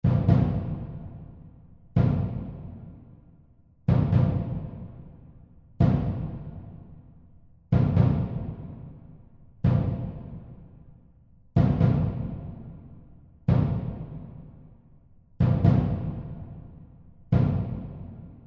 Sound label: tension Loop; Dynamite Digger Eureka